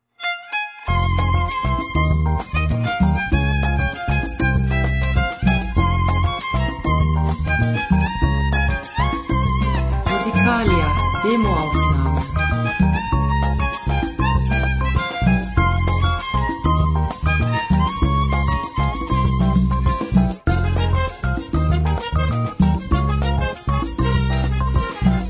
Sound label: violin mambo 98bpm